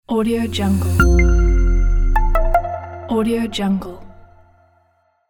minimal logo reveal

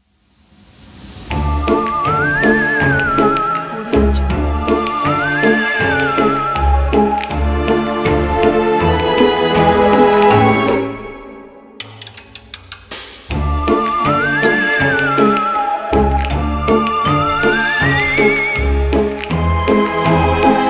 halloween

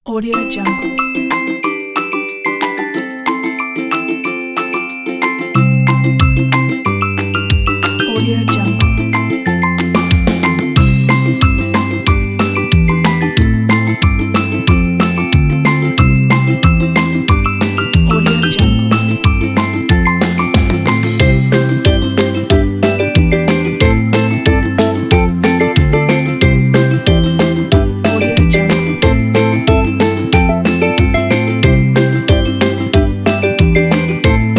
sunny morning

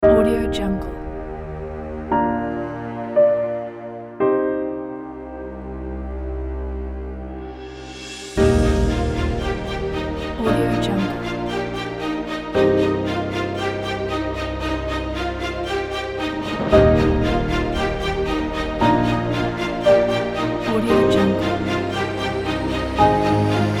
bright epic

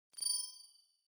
ui sci fi external break

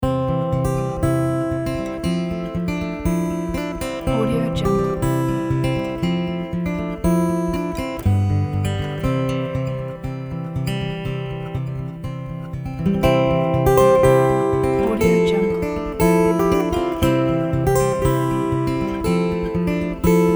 folk acoustic guitar indie

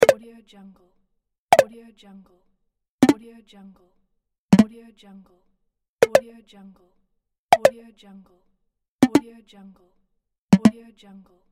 notifications; magic tunes

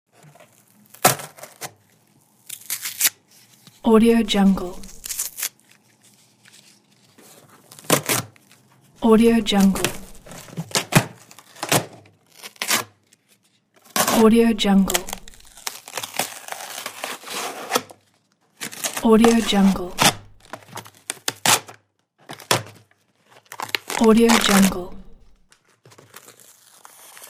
torn cardboard box

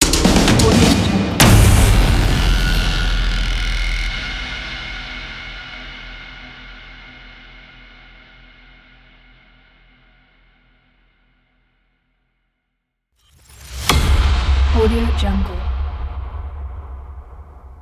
trailer transitions

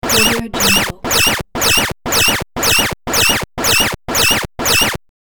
alert retro repeat

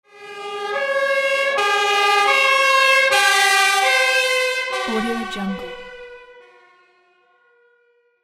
siren by fire department truck wail

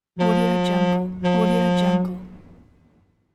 boat horn

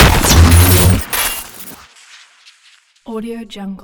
multi launcher

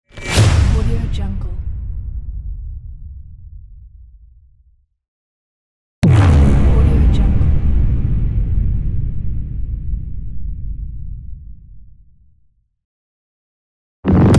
epic trailer hit pack

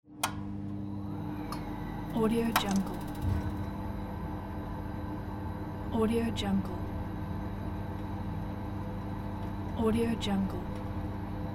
gas boiler

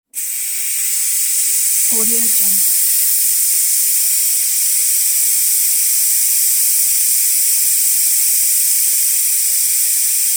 steam

